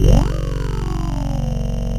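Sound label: synth Flange talk; Best Service Gigapack 1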